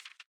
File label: Quick Note, ui